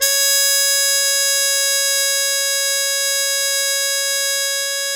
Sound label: MUTE C#2, Mood Trumpet; E-MU Formula 4000 Series Vol. 1; Hip Hop Nation